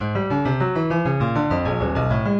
Tone Row; 16 NEJI128

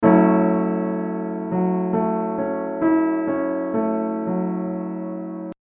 Minor sub seventh

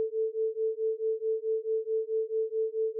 Autogenerated sine 120 cents

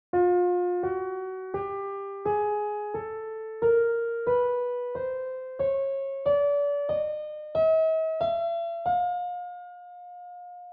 13 Edo chromatic scale

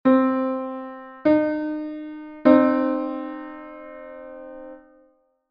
major 2nd, minor 3rd (9-EDO)

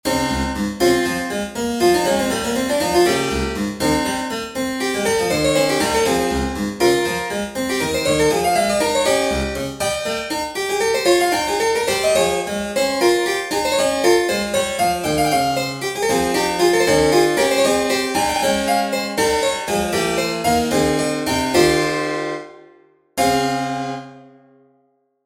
Diasem Lydian Example 33edo semiquartal

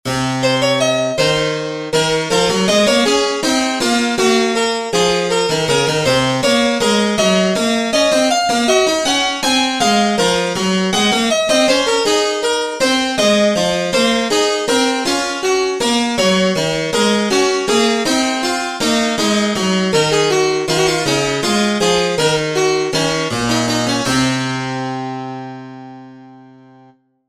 Diasem Locrian Example 21edo

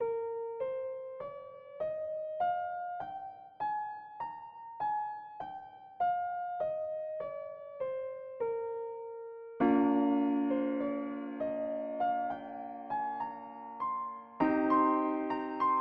16EDO Mavila7 Studies Anti Locrian

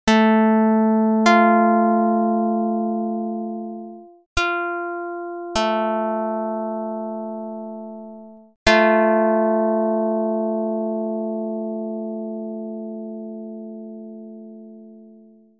pluck 220; Jid 128/81

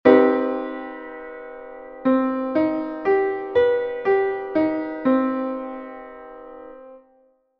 Cmmaj7 (19-EDO)

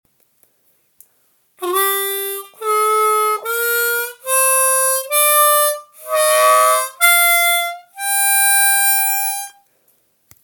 Harmonica Bayati Overblow

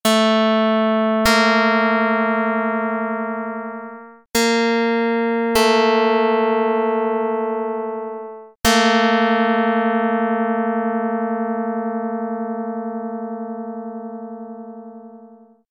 pluck dr220; Jid 21/20

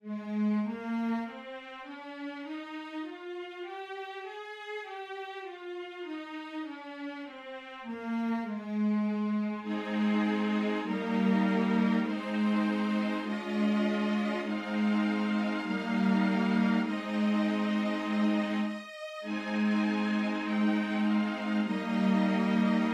16EDO Mavila7 Studies Anti Aeolian

